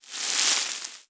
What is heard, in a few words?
Wet Rustle